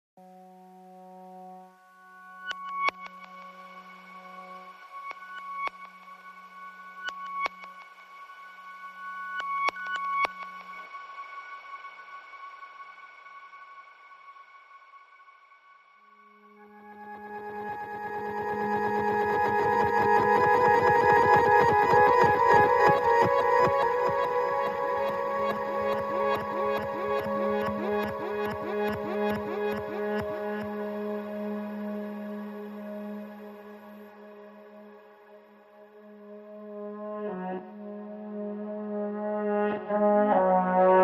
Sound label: Synths Only